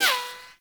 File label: Bullet Impact Ricochet; Warframe